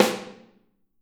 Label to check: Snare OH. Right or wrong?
Right